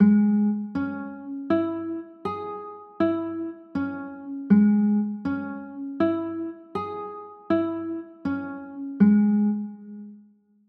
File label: c sharp m, chords